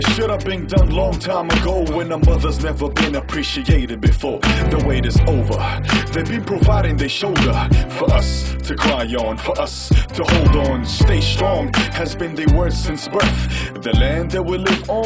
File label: arabic tone